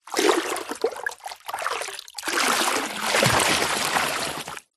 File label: nature water pour medium amount deep